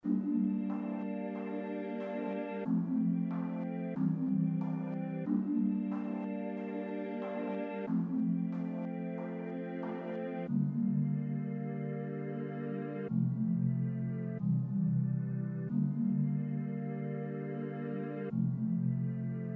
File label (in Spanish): regueton 92bpm